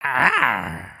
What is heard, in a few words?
Stunned, creatures organic